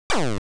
Power Up Appears; wario land

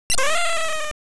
Boss Hit; wario land

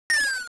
Knife Throw; wario land

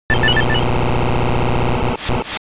door secret; wario land

